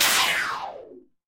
magic scifi laser

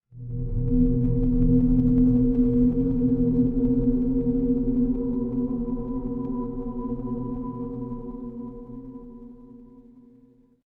horror drone

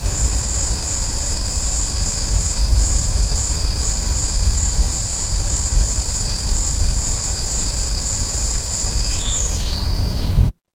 ambience forest

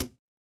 impact metal hit

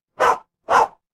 animal dog bark

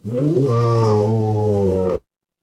animal cat meow

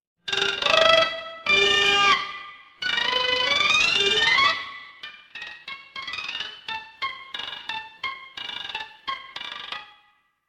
horror creak

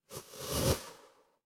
transition whoosh

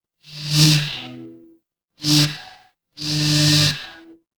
fire electric zap

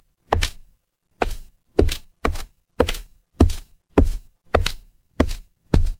footsteps wood

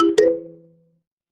feedback confirm

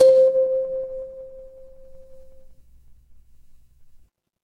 instrument kalimba

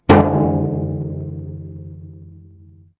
instrument gong

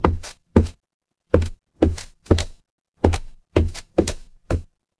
footsteps wood

